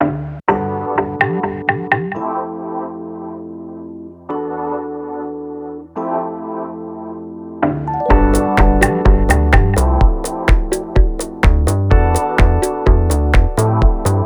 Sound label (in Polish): dzwonek Chill